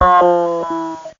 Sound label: talk